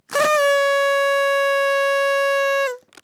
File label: party